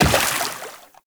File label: Run Water; Footsteps; Warframe; Tenno